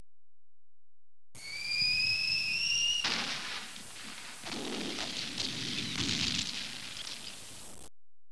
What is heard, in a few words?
EXPL; explosion sounds